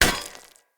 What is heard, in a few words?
Sphere Polearm Medium Impact Rock; Player; Environment